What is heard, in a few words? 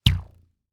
Weapon Bow Recoil Elastic; AdobeCC